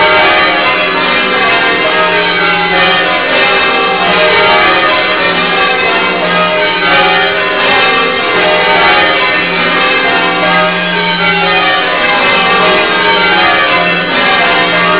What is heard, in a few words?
bells